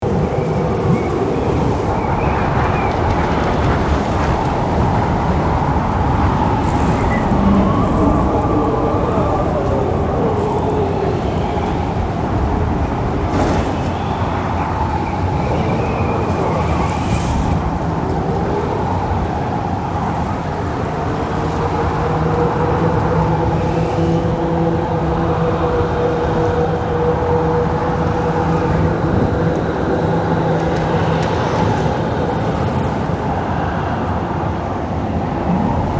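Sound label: Ambience Blizzard Medium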